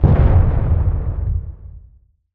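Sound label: explosion short deep; weapons; starruler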